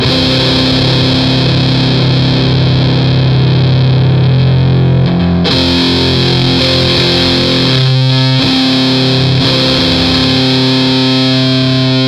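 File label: Fuzztest guitar ultrafuzz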